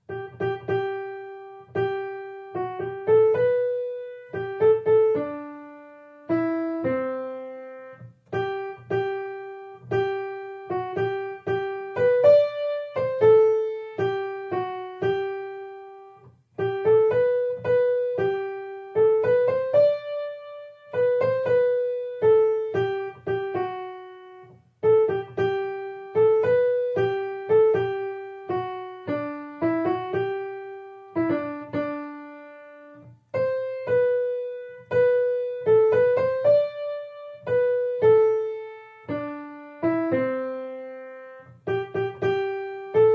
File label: no vocals; Sae will we yet 3rd verse